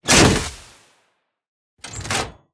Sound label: altar lever pull dry; asylum; devour